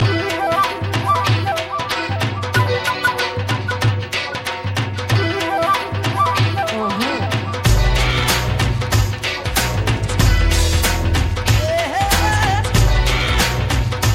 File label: Dhol Music